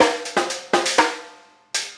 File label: Hi Hat and Snare